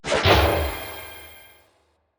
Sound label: Rank E; popslinger; ps4 ps5 switch windows xbox one xbox series xs